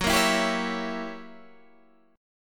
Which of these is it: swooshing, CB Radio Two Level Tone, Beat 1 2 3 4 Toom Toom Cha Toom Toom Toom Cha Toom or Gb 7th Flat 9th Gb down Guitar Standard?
Gb 7th Flat 9th Gb down Guitar Standard